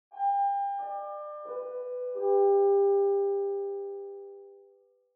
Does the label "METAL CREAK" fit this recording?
No